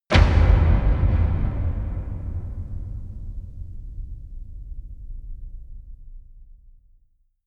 dark cavern hit; hits explosionen

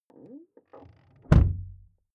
car door close interior hummer h3; Cars

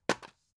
drug package drop deep hard; arzt

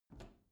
bathroom cabinet open soft; objekte; tueren

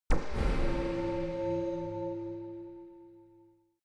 chiming low laser shot; krieg-waffen; soundpool

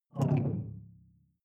sci-fi alien big bionics; swooshes-swishes

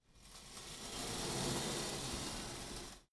pullup machine cable release; sport freizeit; fitnessstudio

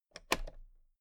toolbox latch open soft; industrie wirtschaft; handwerk

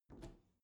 bathroom cabinet open soft slow; objekte; tueren

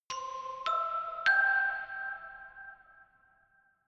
notification glockenspiel creepy triad up major

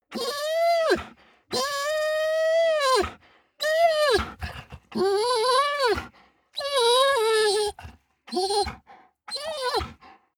dog whine long; natur-tiere; hunde

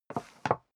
step leather shoes wood parquet scuff; schritte

